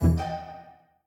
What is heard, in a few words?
spin Button Click; jackhammer 2